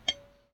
glass footstep; Too Many Stones

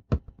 dig cracky; Too Many Stones

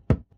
dig cracky; Too Many Stones

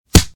damage hand; mobs

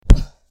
damage hand; mobs

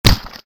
mobs gib chunky

